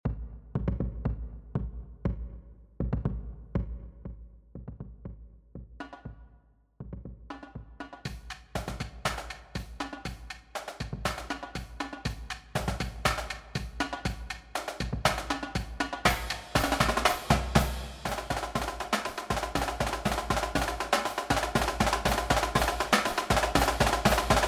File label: Middle School Cadence Hard